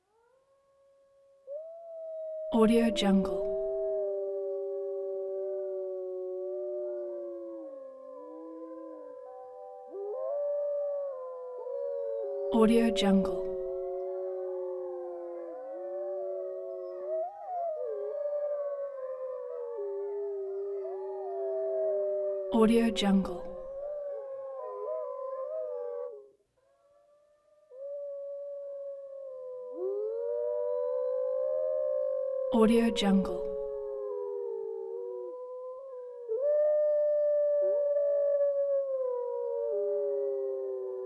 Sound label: timber wolves large pack sustained howling